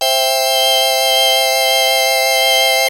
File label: vox, dx7